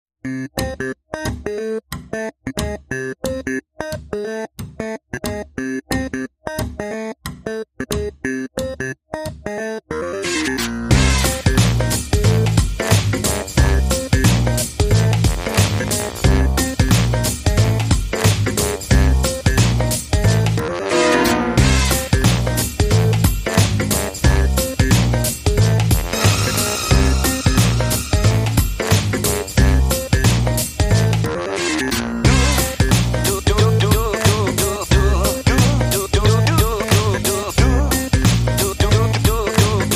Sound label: No Glockenspiel No Piano Mix